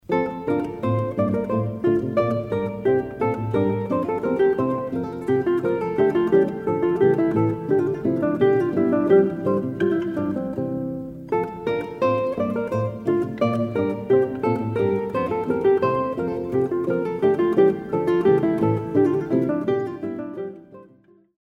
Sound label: Gavotte I II; Bach